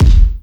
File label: bd; drumkit